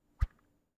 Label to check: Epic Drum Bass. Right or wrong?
Wrong